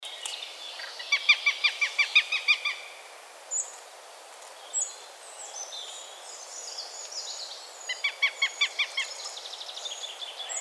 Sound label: Accipiter gentilis, roofvogels; Accipitrinae; Havik; Accipitres; Accipitidae